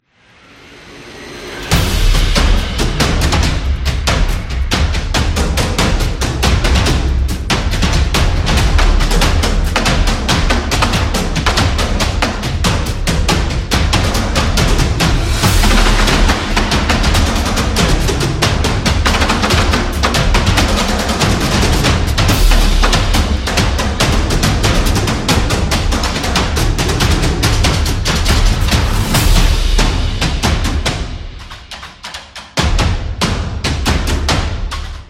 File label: Drums Action; war drums